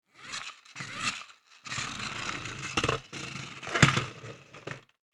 Toy Monster Truck; Miscellaneous Toy Sound Effects; Andrew Fleming

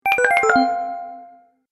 Game Goodbye Bleeps, Video Game Sound Effects

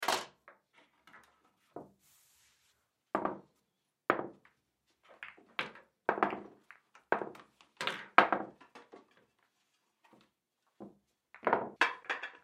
Pool Sequence, Pool Sequence Set Up Break; Blastwave

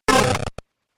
Game Glitch Effect, Video Game Sound Effects

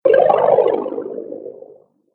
Magic Tokens Underwater, video game

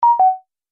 Multimedia Event Notification, beep sound effect

